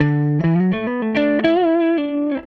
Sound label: Gretsch Guitar Loop